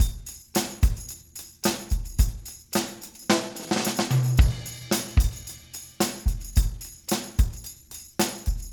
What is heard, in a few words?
110 tam